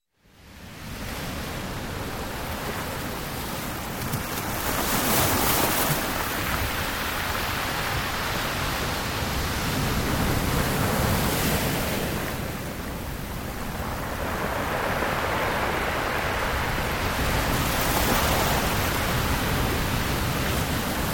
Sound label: Shoreline - Pebble Beach; OCEAN; Sounds of the Earth; Sleep Sounds of Nature